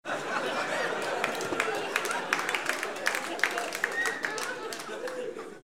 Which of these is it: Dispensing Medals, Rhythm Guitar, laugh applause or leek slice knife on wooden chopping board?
laugh applause